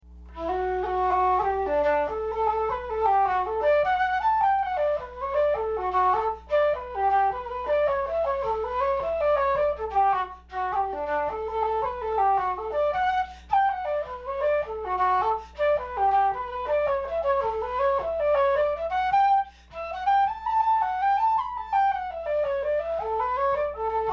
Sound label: SOLO flute